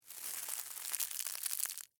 Forest Leaves Slow, Footsteps, Single; Footsteps (Sound Effects:Human); BlastWave

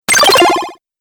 Arcade 8Bit Robot Fx, video game

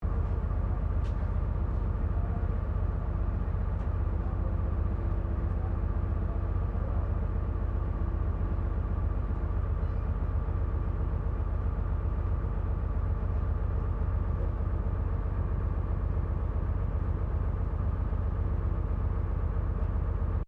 Bus Double Decker Bristol VR Interior Idle; Bristol Vr 1971; Bellarine Tourist railway vehicles; Busses

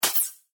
Pneumatic Hose, Scifi, Disconnect, Expel; Science, Fiction; Spacecraft

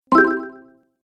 App Delay, ping; multimedia

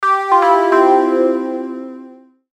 Logo Synth Descend; Sonic Logo; Blastwave

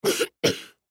Human Cough; Cough Short Male Seven Yrs Boy; Human Cough Short Male Seven Year Old Boy; Sick; Blastwave

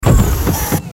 Sci Fi Robot Machine Servo Motors; Robots

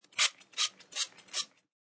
Spraying a pump hair spray bottle.; Hair Spray Four Pumps; Primping; Human

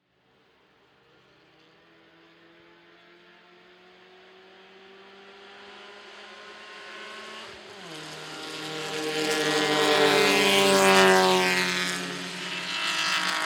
Race Car, 3 Cars Drive By, 1960S Era Road Racers; Three 1960 Sports Race Cars By , 6 Cylinder; Vehicles

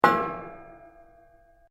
METAL ON METAL; Metal (Sound Effects:Materials)

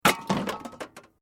Metal Light Movement Minor

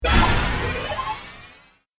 Digital Impact; miscellaneous impact; Miscellaneous Impact Sound Effects

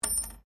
Coin Penny Single Drop Wood; Common coins; Money Sound Effects

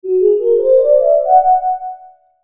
Logo Soft Synth Ascend; Logo Soft Synth Ascend Melody; Sonic Logo Sound Effects; Blastwave

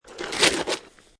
Snow And Ice Ice Scrape Small; Ice (Sound Effects:Weather)

